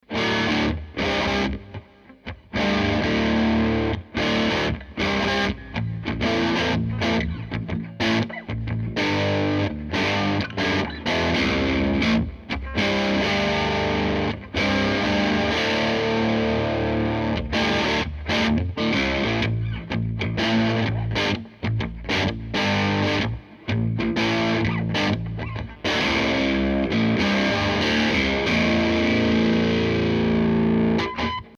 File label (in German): steg dist